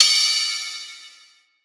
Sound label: Ride Acoustified; Drums